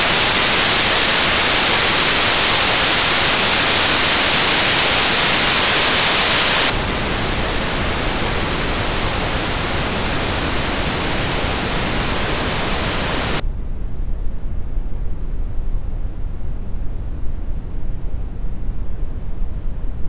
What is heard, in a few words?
noise